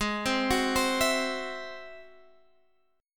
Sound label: G sharp Augmented 7th G sharp, down, Guitar, Drop D